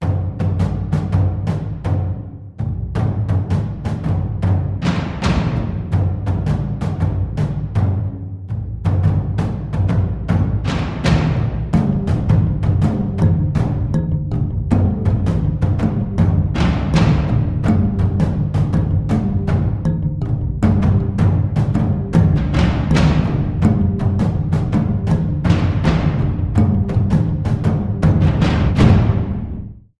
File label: Tribal Call, bang on a drum